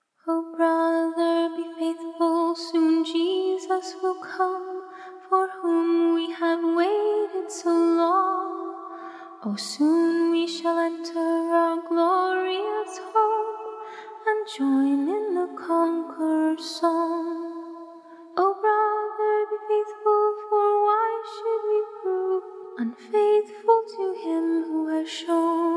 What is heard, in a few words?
acapella hymns